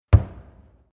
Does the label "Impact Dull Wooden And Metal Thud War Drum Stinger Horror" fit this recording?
Yes